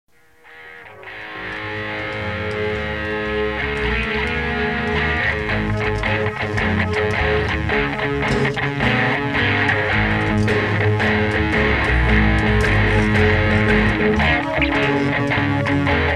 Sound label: Church Blues